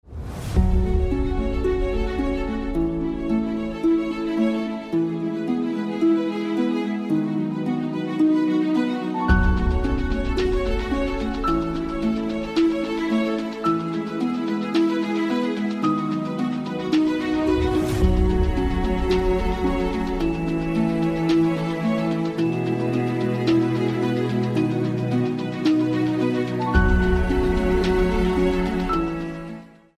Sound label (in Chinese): 专题片背景音乐